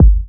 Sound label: Kicks; Vengeance Samples